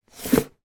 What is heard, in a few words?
household book hardback pull out from book shelf library; zapsplat